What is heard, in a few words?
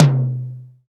TOM1, Roland R-8